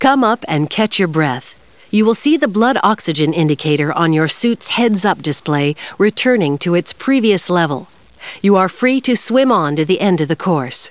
breath

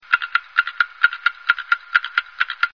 alarm clock ticking

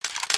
reload

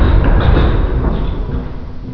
big stop, plats

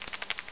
roach walk

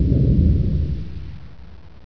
distant mortar, ambience

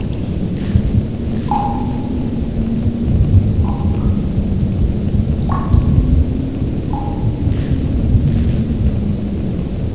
cave wind

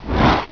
bullchicken idle

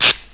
spark, buttons

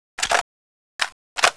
sniper reload first seq, weapons

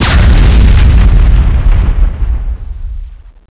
shock rifle explosion

